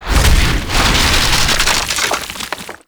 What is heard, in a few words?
Landscape Heat Fissure Open; Gameplay; Warframe; Venus Open World